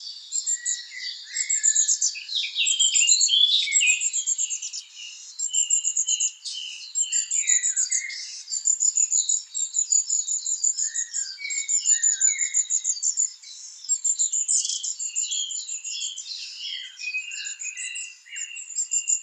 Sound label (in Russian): sinicza dlinnohvostaya aegithalos caudatus